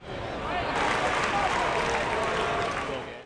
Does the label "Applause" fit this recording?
Yes